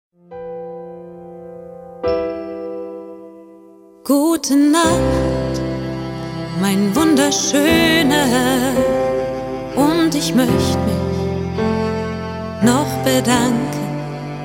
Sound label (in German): Piano Version